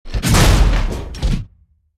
Assault Smash

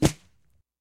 Obstacle Rope; hello kitty friends match; android

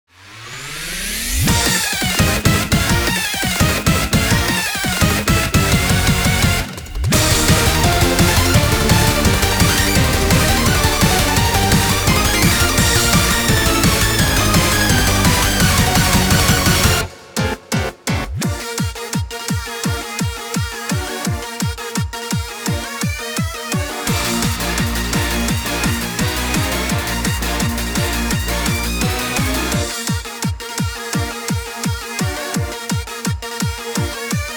Instrumental; PyoPyoroPyooo!!; Excycle Original Soundtrack

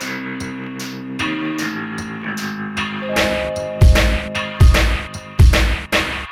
Bpm76 In L

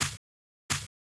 Goblin Walk

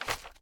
Footstep Paper-Sheets

